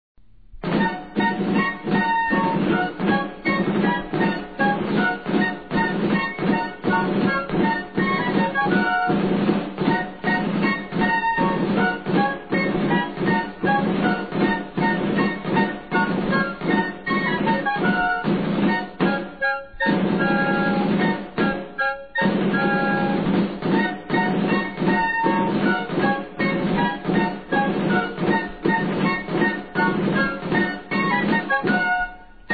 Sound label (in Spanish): Llamada, TOQUES DE CARLOS III; ORDENANZAS CARLOS III; 1769